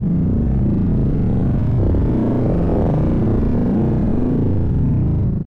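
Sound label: landing of a space alien saucer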